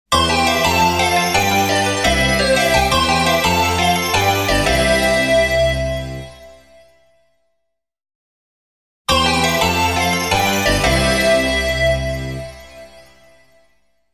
Splash Cut, Sounders, Bumpers; Sounders, Bumpers, CUES & IDENTS; DennisMusicLibrary

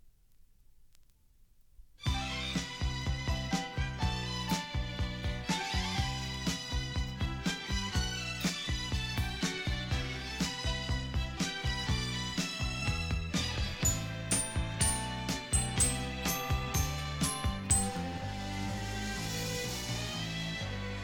topformat maxi jingle single

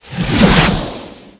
poof

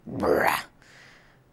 barf; Rosegarden

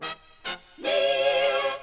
jinglepackage; topformat LP jinglepackage 1

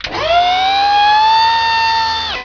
flaps

